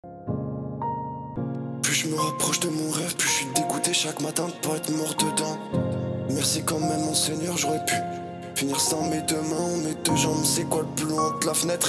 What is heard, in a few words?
test piano; maquettes